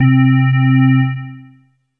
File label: E-Mu Proteus FX Hollow C3